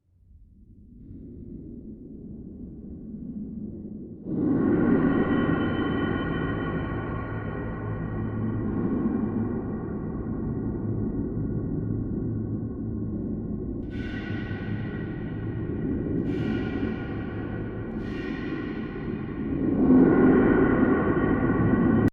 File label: Dungeon Drone Horror Rumble Metallic Impact; Scary Soundscapes